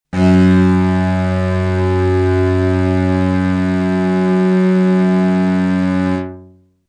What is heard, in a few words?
Bass Strings; synth patches Korg Prologue; Dystopia